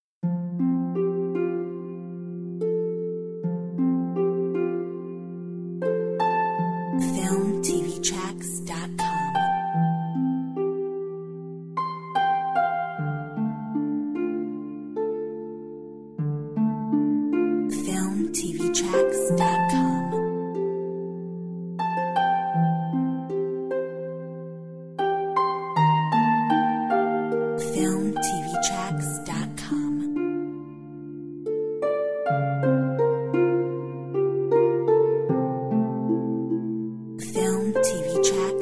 Whisperings Harp

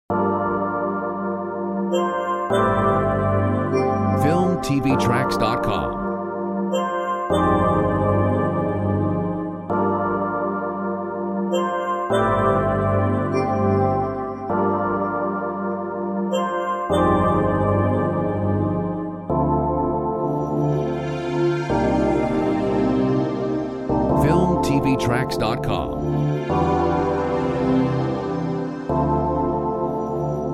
Bio tech

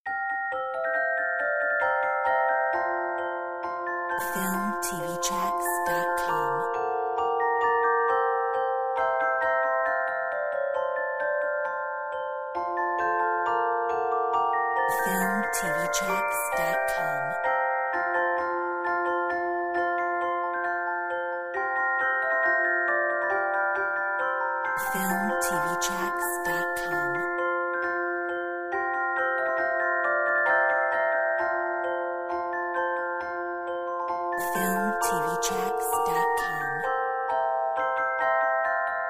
Jingle Bells

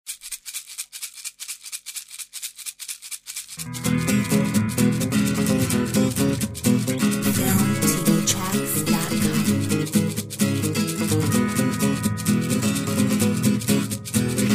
Bossa Bistro

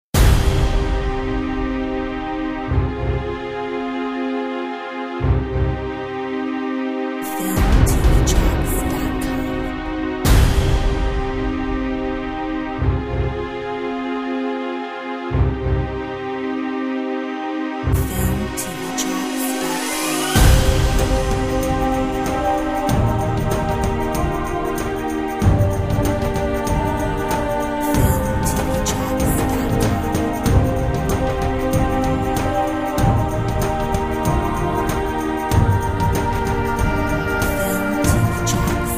A Powerful Story ethnic

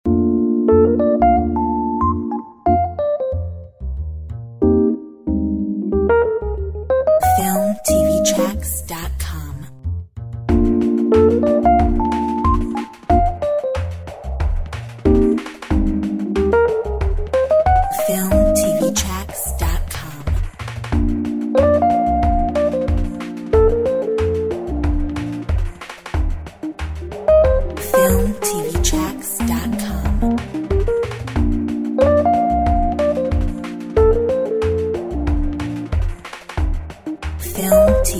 Jazz

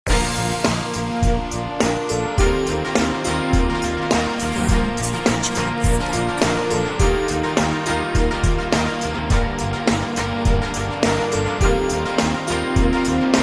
Uplifting story

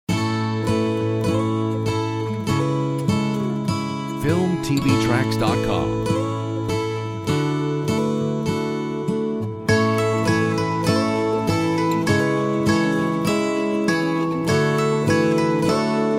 Mandolin

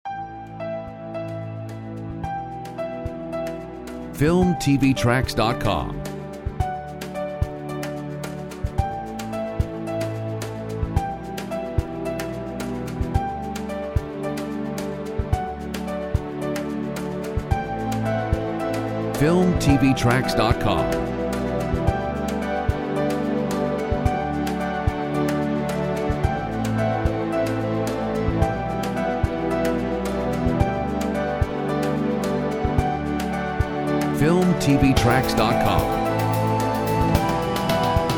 Future Dream 110BPM

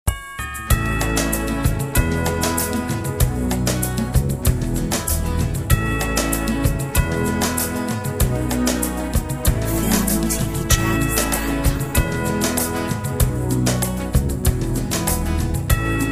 Spring Flowers

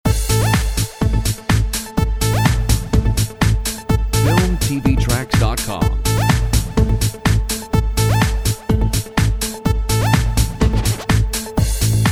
My expectations vocal

